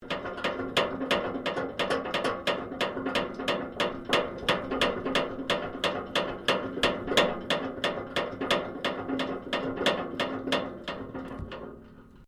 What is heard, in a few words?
MECHANICAL SUSPENSION METAL SPRING WOBBLE LONG; Variou smechanical sounds; Wobbles (Sound Effects:Objects)